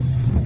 loop Unit Building; loops; Mindustry

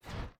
mech Step Small, movement; Mindustry

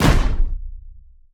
shoot Diffuse; Mindustry

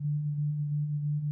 loop Hover; loops; Mindustry

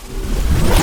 laser charge; Mindustry

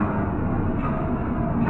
loop Grind; loops; Mindustry

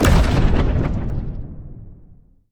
block Explode Explosive; explosions; Mindustry

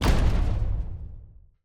block Explode Alt; explosions; Mindustry

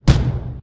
artillery; Mindustry

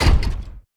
payload Drop; Mindustry

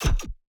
payload Pickup; Mindustry

